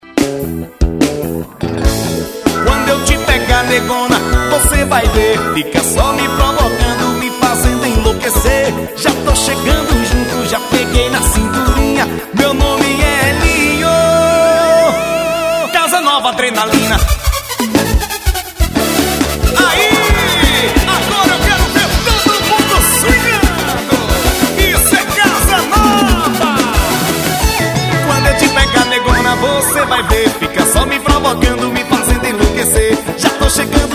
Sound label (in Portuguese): forró